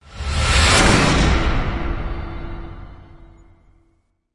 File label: Wendigo Hit Swell; Ninja Tracks